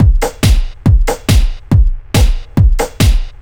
Kik and Snare Loop; Dance

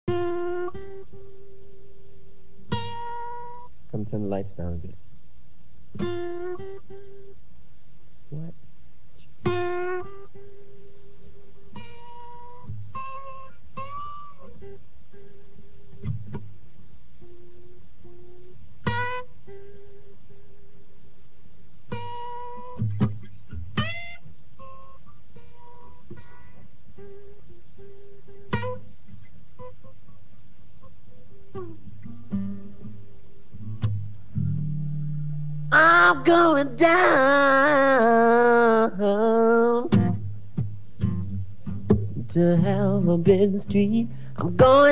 Acoustic

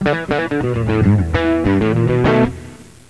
GTR RIFF; MUSIC

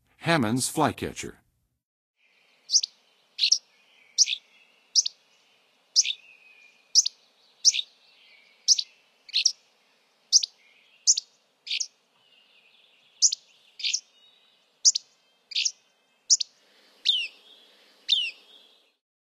Hammonds Flycatcher, Western USA Songbirds; Nature Songs; N. America; Songs of Birds of North America

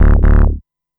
bass, dance

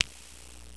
FNGR SNAP; MUSIC; PERCUSSN

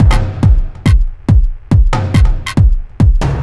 Kik and Perc Loop, Dance, Beats

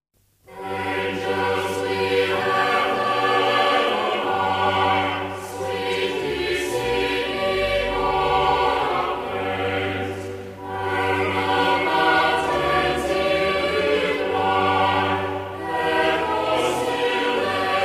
Cathedral Choir - Angels; Westminster Cathedral Choir; Christmas

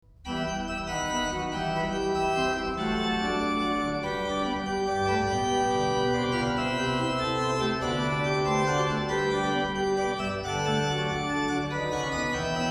partita vii, Organ; Bach; Great Organ Works 3

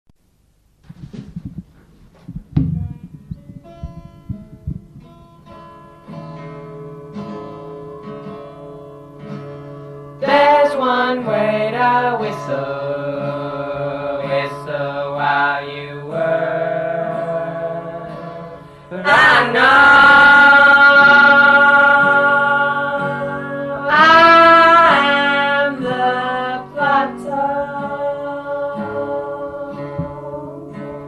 Whistling

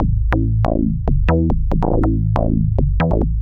bassline, dance

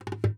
JAZZ TRAP KIT

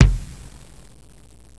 KICK

